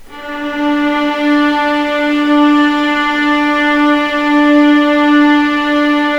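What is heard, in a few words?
D2 legato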